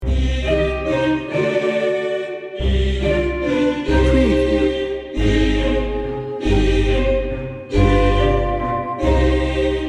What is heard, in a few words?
mystic voices loop